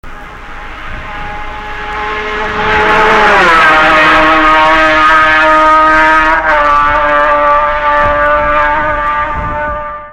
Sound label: Yamaha, vintage bike; 1965 Reid Yamaha 2